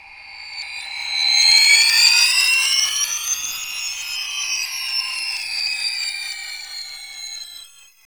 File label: MUTANT BELL; Zero-G Ambient 1; MUTANT BELLS